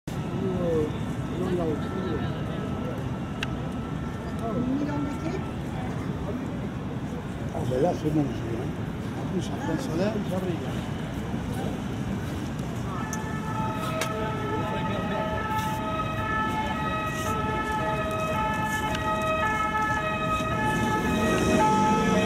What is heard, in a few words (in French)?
Ambulance sirène américaine, Emergency Ambulance with American siren; SMUR